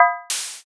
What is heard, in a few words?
basket